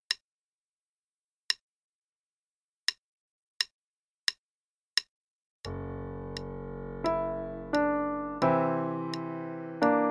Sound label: Improv, qn=88; Level 2A; Moon Shadows Improv; Faber Improv